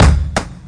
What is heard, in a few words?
Heaven KIK, dirty hip hop; Dirty Hip Hop Kit; style kits